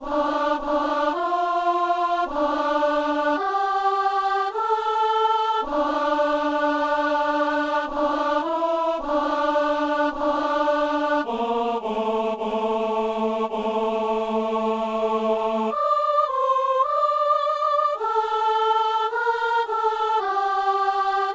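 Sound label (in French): soliste et ténor; messe simple; kyrie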